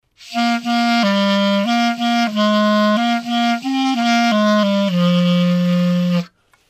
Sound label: Clarinet; among the reeds